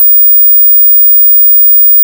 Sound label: 15khz